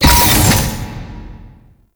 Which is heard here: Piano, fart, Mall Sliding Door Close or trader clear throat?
Mall Sliding Door Close